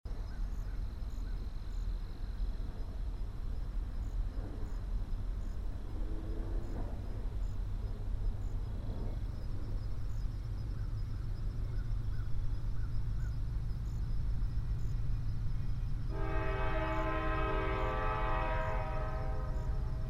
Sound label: Freight Train; olofonia